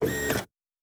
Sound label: servo small, sci-fi sounds, mechanical